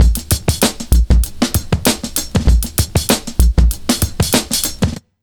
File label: 97 Beat, Pure Electro; USB Soundscan vol.03; AKAI